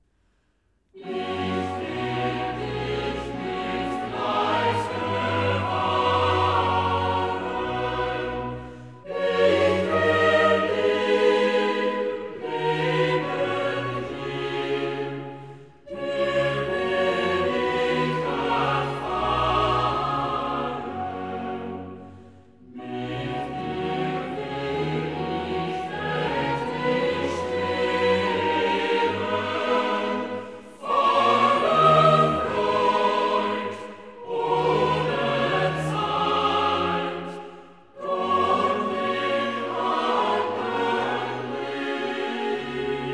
Chorale; Ich will dich mit FleiЯ bewahren; BACH; Christmas Oratorio

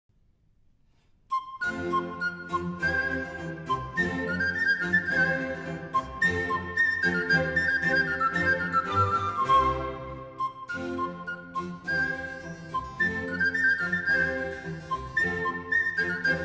Gavotta (Allegro moderato), Pan flute music; A. Corelli; Concerto in F

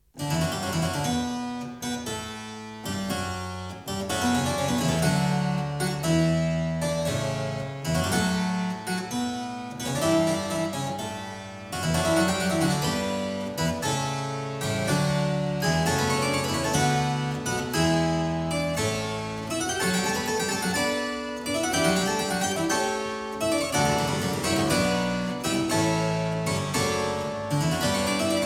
Fugue in D major, clavier; Prelude & Fugue No.05; BWV 850; BACH; WELL TEMPERED CLAVIER; BOOK I

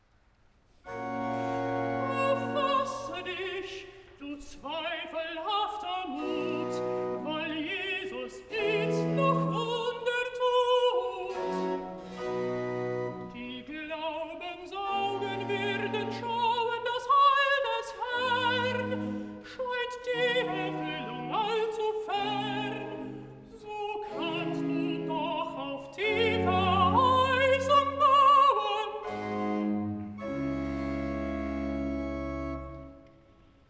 Recitativo (Alt), BACH for ALTO; vocal; BWV109; Ich glaube lieber Herr hilf meinem Unglauben; O fasse dich du zweifelhaft

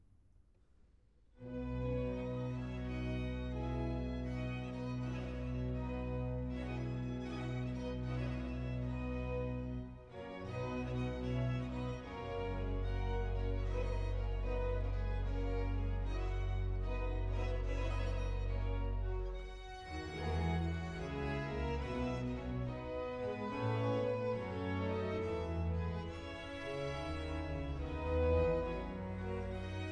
Pifa; HANDEL; MESSIAH

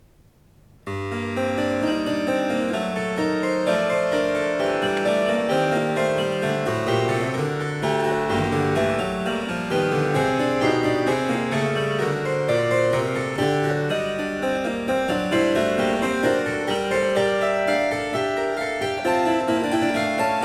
Cembalo; Variatio 21 Canone alla Settima (a 1 Clav); Bach; Goldberg Variations

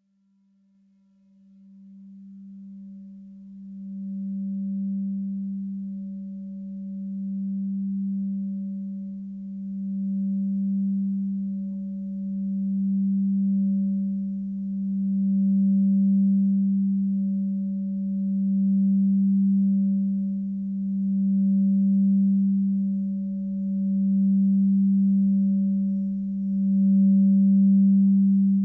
singing bowls; Yoga and sound

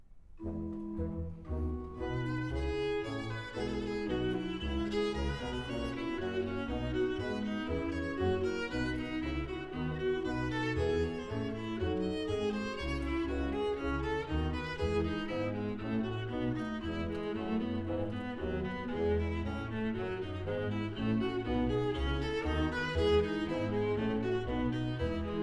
Chorale; Ich dein betrübtes Kind; Bach; Cantatas; BWV199; Mein Herz Schwimmt in Blut

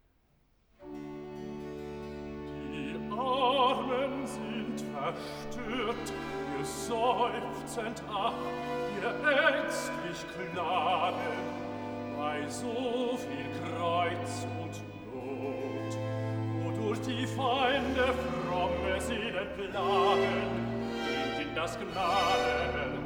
Recitativo (Bass); Die Armen sind verstoert; Bach; cantatas; BWV002; Ach Gott, vom Himmel sieh darein

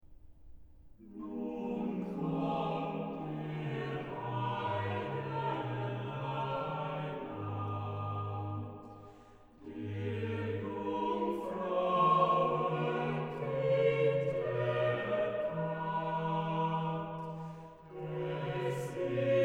Chorale, organ; BWV0036; Nun komm, der Heiden Heiland; BACH; BACH ORGAN WORKS; CHORALE PRELUDES

